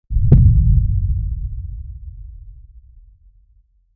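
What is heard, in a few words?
giants footstep small earthquake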